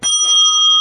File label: ding